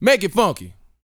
VOCAL F; ILIO

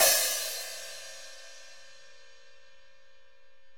Open fizz SP +3c; Digital Sound Factory

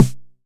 909 SD; Goldbaby Tape808 & Tape909; The Tape 909

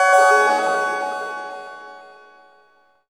DIM SCALE; Zero G; Malice In Wonderland

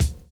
STONESNAR; Univers Sons

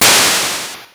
Perc, Game Boy Advance SP; Nintendo

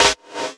SD, 160 BPM; Ueberschall; Drum & Bass Flash Trackz

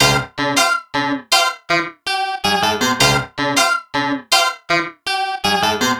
Clav; Keys; Keyboards

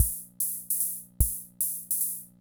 Kent K-220 Rhythm System Loop, drum machine; Kent K-220 Rhythm System Sample Pack; 300 Drum Machines

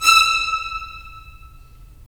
Violins; Roland - LA Composer vol. 4; Violins Sh

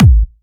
Trance Kicks, Bassdrums

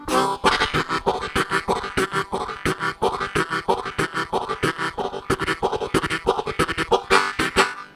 Fast Triplet Bass, Harmonica; Zero G

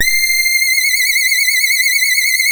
Synth and Organ; InVision Interactive; Keith Emerson; The Most Dangerous Synth and Organ

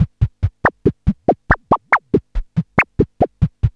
MACHINE BEAT; Zero-G Ambient 1

